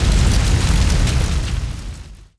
Door

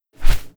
Garuda Walk

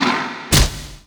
Hungry Wolf Die

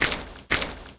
Mushroom Run

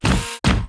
Melee Run; Seer; GamigoNA

